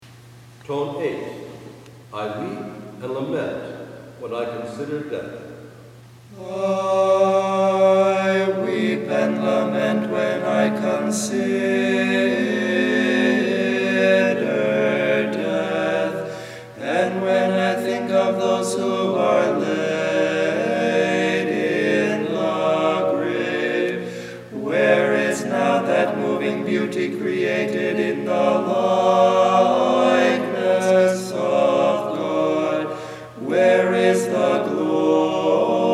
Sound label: Stichera Tone 8; PARASATAS (TEACHING)